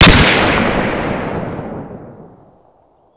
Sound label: fnfal; Modern Warfare